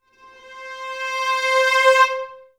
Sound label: VA CRSC C4, Advanced Orchestra; Best Service